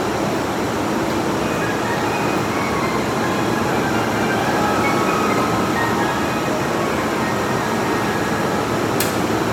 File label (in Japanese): 京葉線八丁堀東, train sounds